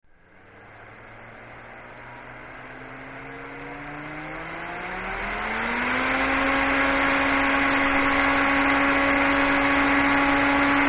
Electric Turbine Blower; Whine, Slow On / Run / Down; power on off